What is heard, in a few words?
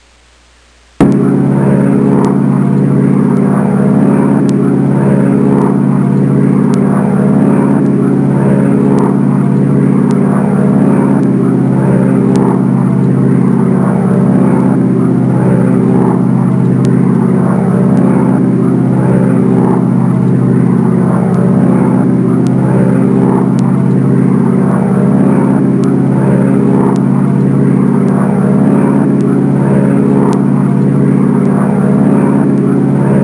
Airplane Overhead; Soundsation; Vehicles